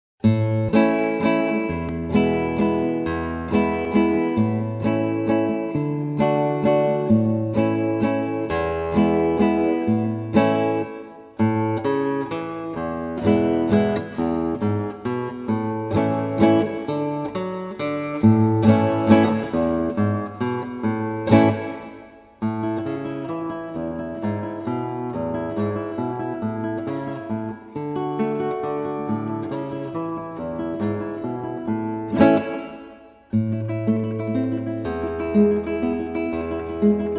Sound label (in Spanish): La Guitarra Espanola